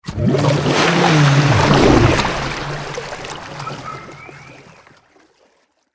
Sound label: Sea Monster Surface; the unfinished swan